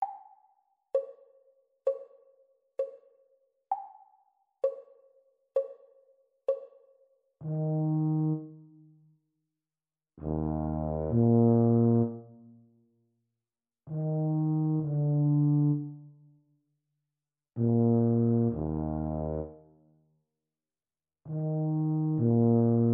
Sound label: Eb tuba duet practice speed; Grade 1